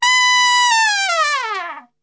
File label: B 2, Brass; Best Service; Brass Super Section; TRP LNGFLL